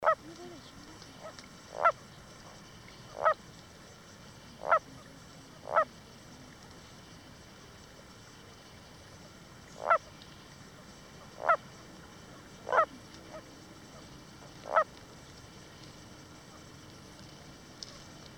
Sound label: Rana pseudodalmatina